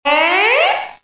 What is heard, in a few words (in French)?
Saut, Cartoon; bruitage